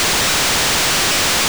Water Flow; Oracle

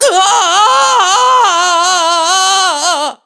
Diving; Link caught by a falling Groose while sky-diving; Skyward Sword

